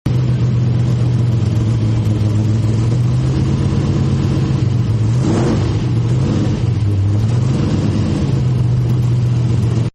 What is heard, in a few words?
Hot Rod Idle, car; Hot Rod 3 Idle And Rev; Partners In Rhyme